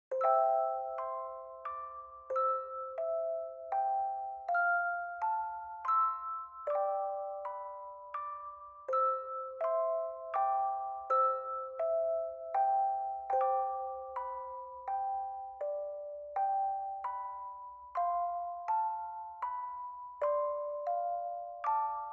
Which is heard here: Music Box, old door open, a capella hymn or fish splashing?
Music Box